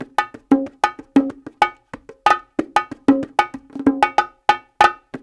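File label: 93 bpm, Timbale Bash; Spectrasonics; Supreme Beats; African Contemporary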